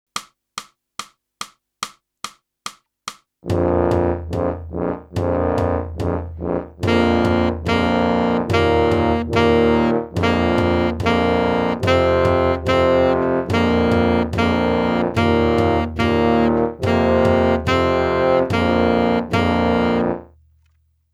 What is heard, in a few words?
sax